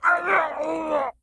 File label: die zombie; cstrike